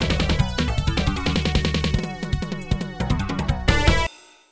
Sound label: Game Over Jingle; Burning Dragon Plus; PC-9801; OPNA